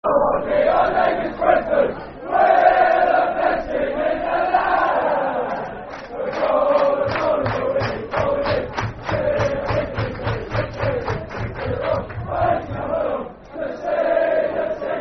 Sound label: Football Chant (Soccer Song); Our Name Is Brentford; Brentford Bfc